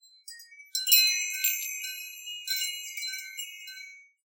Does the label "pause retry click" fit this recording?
No